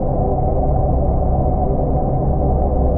engine; Earth and Beyond; EA Games

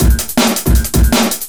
drum loop D+B 160; Drum+Bass

